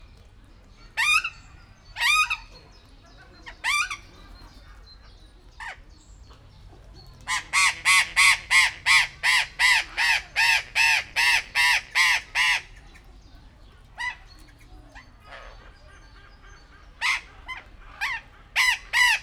hangosak a sziklapapagajok futtyszeru a rozellapapagaj; debreceni zoo 2019

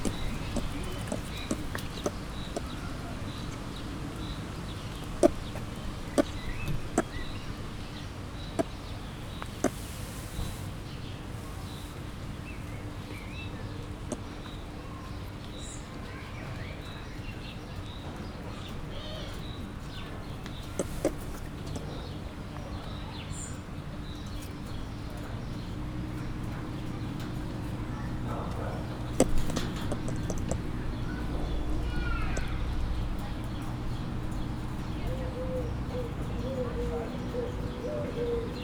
az elején intenzívek, gyűrűsfarkú maki; kecskemeti zoo 2016

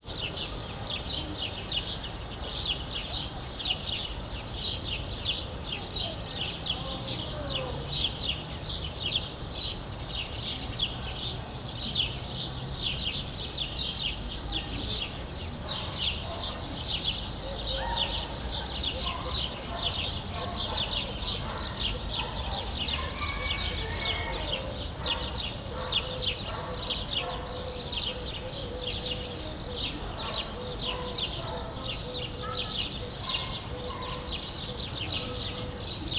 kozben ketszer kukorekol a kakas, hazi vereb; debreceni zoo 2012

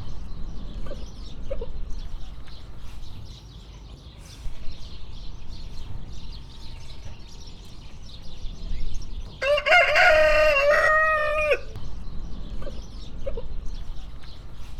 kukorékol, fogolyszínű magyar kakas; Hortobágyi Nemzeti Park 2013

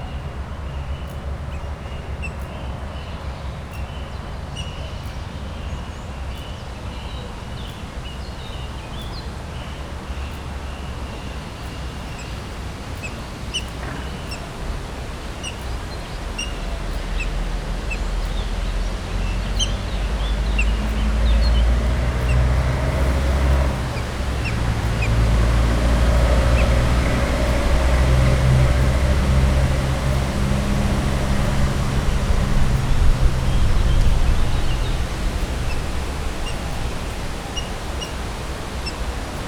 csipogó hangot hallat, ázsiai kiskarmú vidra; gyori zoo 2014

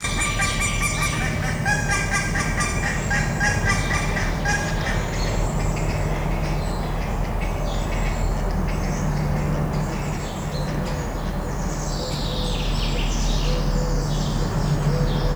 kanadai lúd; szegedi zoo 2011

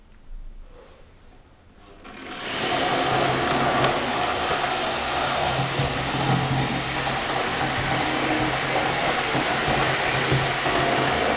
kisiklik, modellvasut h0; perkupa

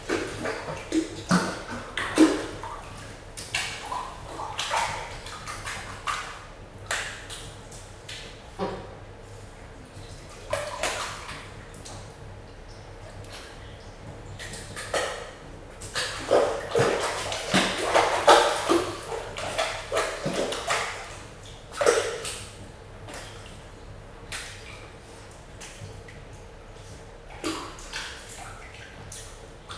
kispingvinek zárttérben úszkálnak, pápaszemes pingvin; jaszberenyi zoo 2015

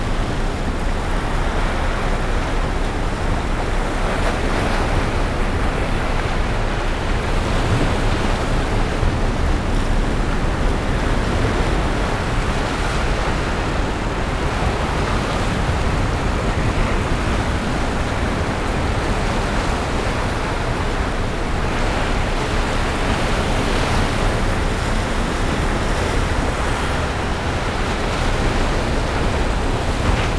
uttest mellol, a kogat tetejerol, a vege fele auto, ocean; orzola

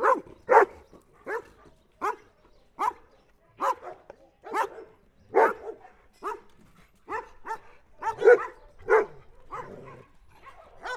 falusi házőrzők, kutya; állathangok; paraszt udvar

